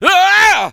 ahhhh; kleiner; svencoop addon; playersounds

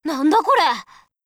weap get rare; svencoop addon; playersounds; Tenno

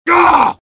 sniper pain severe; svencoop addon; tfc; player

